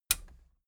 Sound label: metal button radio push enable